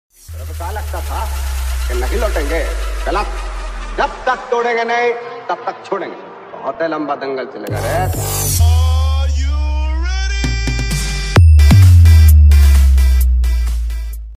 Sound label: remix, speaker check; star music boss